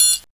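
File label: triangle open, percussion; drum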